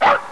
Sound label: bark, dog; Fate; MONSTERS